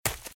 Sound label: Footsteps Leaves Sprint Left; RadwinIsland